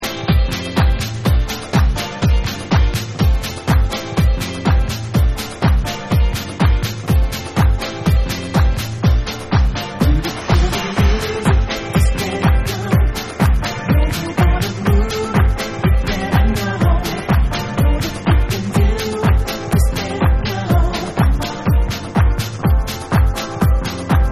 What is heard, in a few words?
Vocal Remix